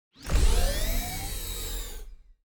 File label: glass door open